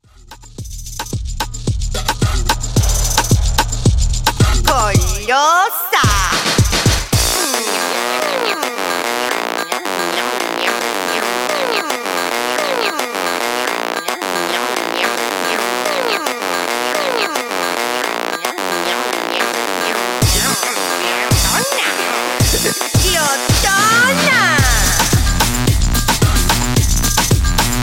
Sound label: House Mix 110Bpm